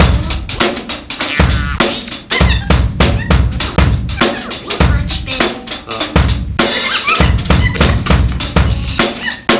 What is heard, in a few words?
Drum Crazy, Breaks; Zero-G; Beneath The Planet of The Breaks